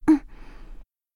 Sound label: Breathing